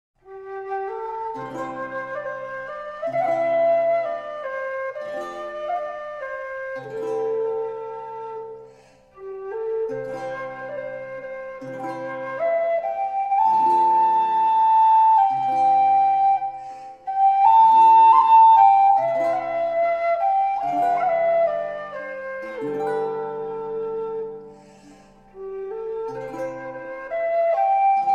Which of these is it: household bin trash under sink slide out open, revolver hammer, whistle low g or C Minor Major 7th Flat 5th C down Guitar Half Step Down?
whistle low g